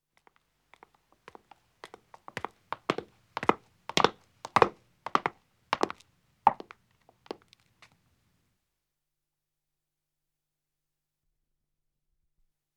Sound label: Stone Stairs (Echo) Descending; Footsteps; BBC Sound Effects Library